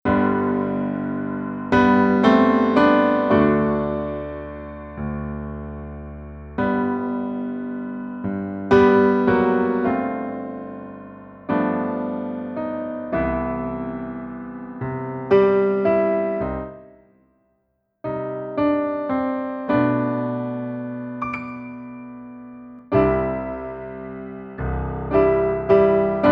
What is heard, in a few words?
Gospel Piano ver.; Animal Crossing Wild World